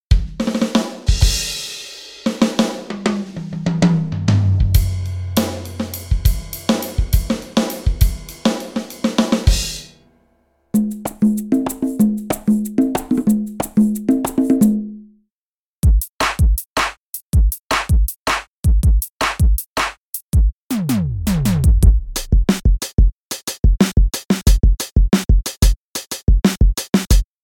studio drums; instruments; acoustica; Mixcraft

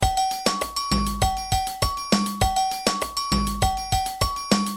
Afoxe

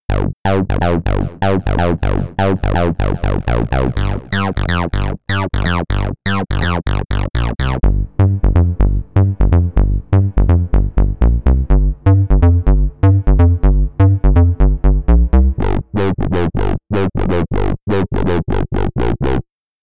alien 303 bass synthesizer; Mixcraft

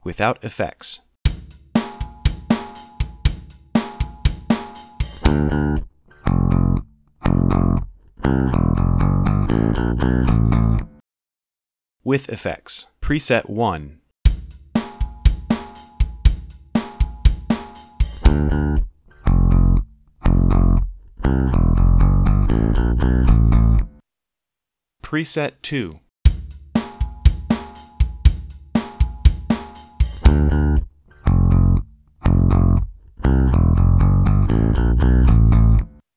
vacuum tube bass enhancer; vbe-1; effects; Mixcraft

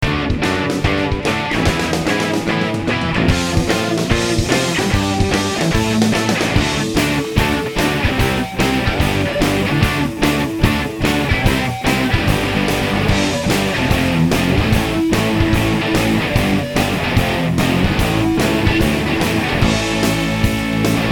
american punk; songkits; Mixcraft

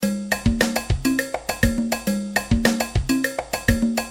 Mambo 7 4 Refrain

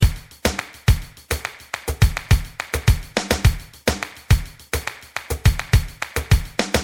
Buleria

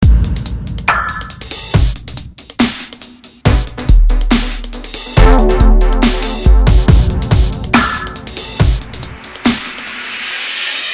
dark dubstep; songkits; Mixcraft

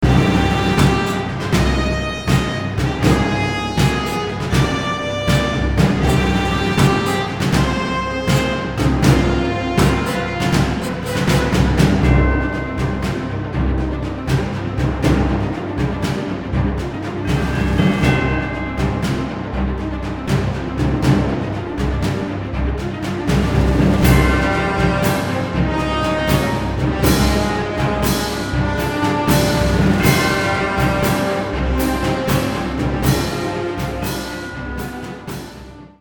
film score; songkits; Mixcraft

